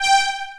funpark light floor; Tony Hawk's Project 8; XBOX

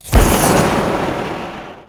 hoop; WhiteOut

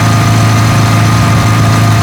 rpm, sled; WhiteOut

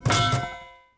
pipe hit metal; Star Wars Obi-Wan; XBOX

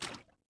fs water, footsteps

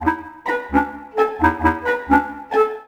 Expectant

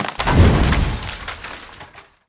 collapse floor small

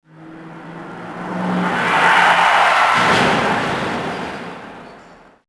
car skid crash garage distance; Max Payne

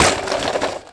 bmx fall; terrains; Tony Hawk's Project 8; XBOX

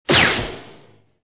green lightning

